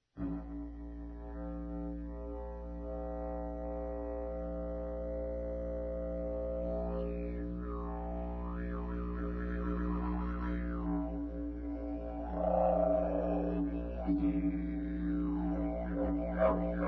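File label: pvc A Sharp, didge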